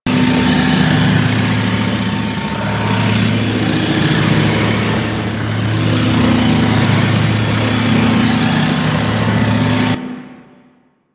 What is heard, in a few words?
Loot Room Elevator Loop; Ambience; Warframe; Orokin Moon